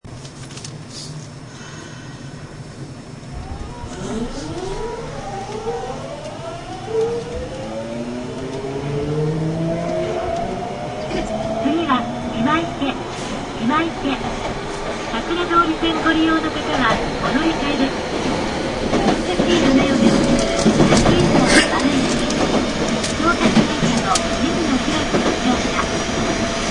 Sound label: door